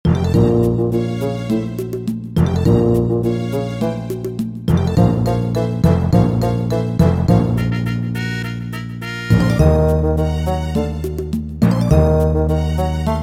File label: Conversation; Goemon's Great Adventure; N64